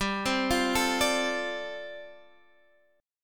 G 7th Suspended 2nd Suspended 4th, Guitar Half Step Down